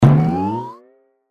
bounce; Lucha Rumble

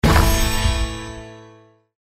anticipation Wind Up; Dynamite Digger Eureka

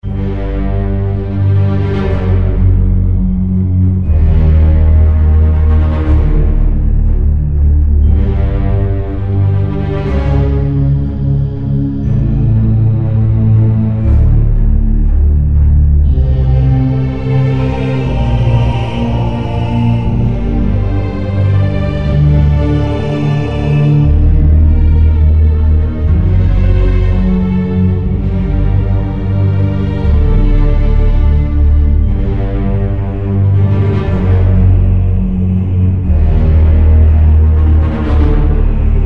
bg music with drone; Crystal Furnace

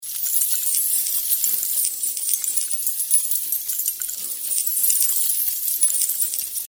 coins loop; Champions of Valhalla

